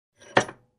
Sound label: Construction